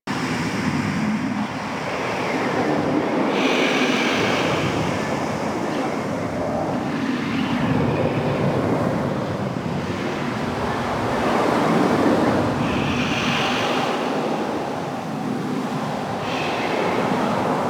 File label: Settlement Ravine Ext Amb